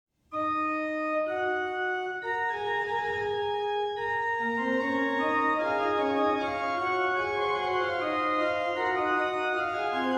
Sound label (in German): Christ unser Herr zum Jordan kam, Bach Organ Works; Clavierbung III; BWV 685